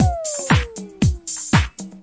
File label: 118 disco speedball, drum loops